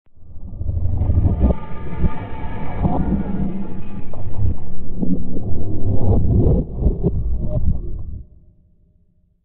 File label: minecraft cave sound